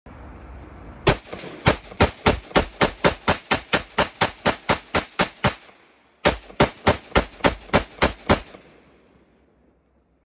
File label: Norinco CQ, اسلحه; نورینکو سی کیو